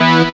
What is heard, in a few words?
DR. LOOP; X-Static Goldmine 3; CHORDS